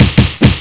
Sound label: punch, fighting